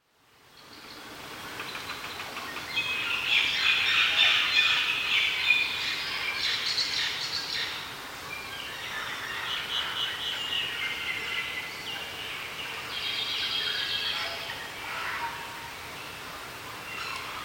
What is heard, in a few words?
NEW ZEALAND FOREST